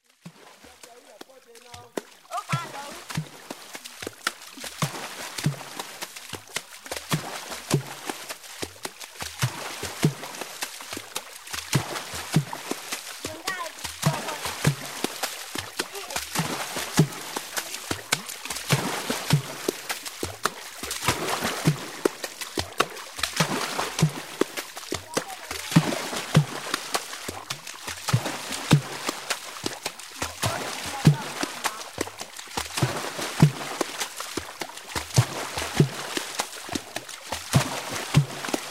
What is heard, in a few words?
Sounds of Nature Rainforest African Water Drums